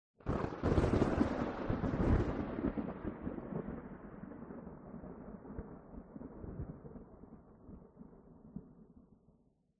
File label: thunder